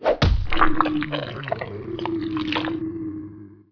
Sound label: contaminator attack; Emperor - Battle for Dune